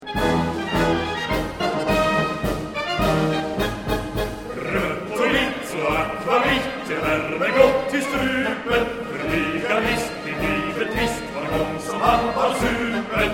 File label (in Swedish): snaps